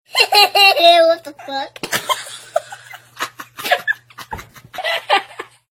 Hehehehe

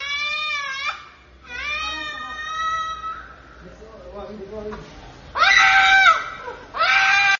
Kid crying louder

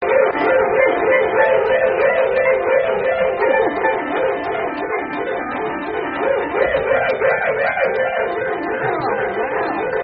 Horse Playing The Piano; Meme

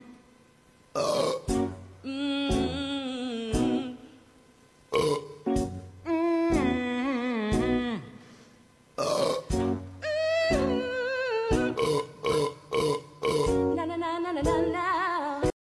Burping; Fiona and Shrek

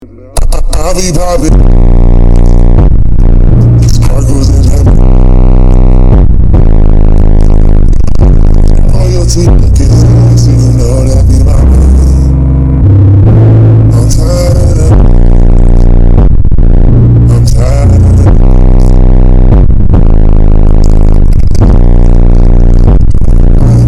super bass

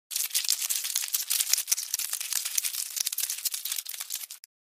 Cockroach Sound; roaches

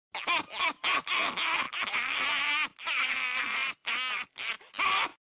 Pelicans laughing; animals

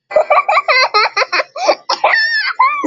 Laughing; Bingo Heeler

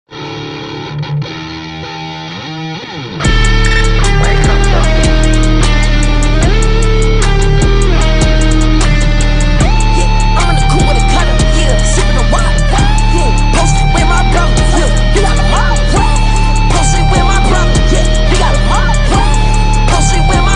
guitar remix; made with Voicemod

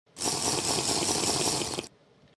Minecraft Potion Drinking

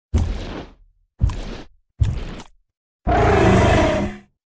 crawler; meme

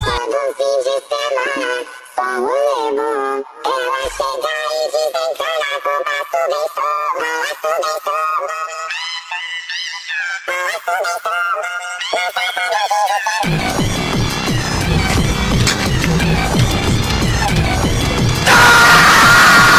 Baso Peso Phonk

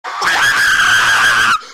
Screaming; Ksi; Deji

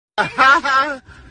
ahaha; meme

